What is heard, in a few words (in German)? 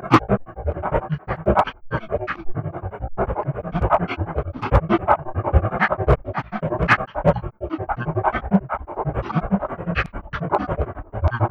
granular hechel; gerausch